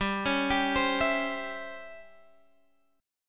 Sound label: Db Minor Major 7th Flat 5th G